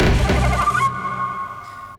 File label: japanese traditional